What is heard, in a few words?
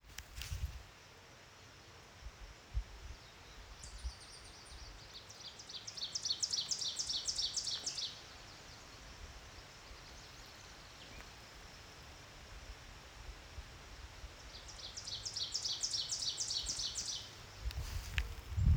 ovenbird